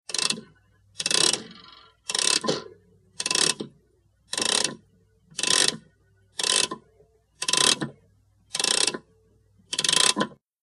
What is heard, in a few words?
CLOCKS MANTLE CLOCK: INT: Winding mantle clock, with spring movement